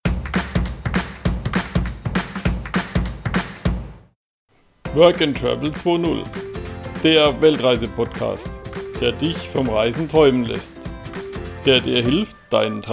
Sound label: Intro Upbeat